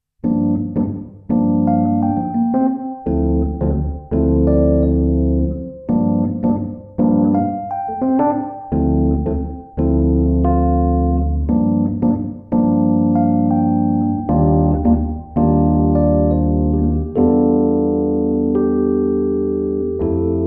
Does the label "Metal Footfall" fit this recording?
No